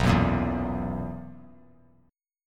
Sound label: B Major 7th Suspended 4th B; BM7sus4; strummed